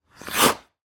Ice Skates Scrape, Sports, Ice, Skates, Scrape, Ice, Stop; Ice Skating; Blast Wave